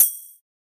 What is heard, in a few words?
metal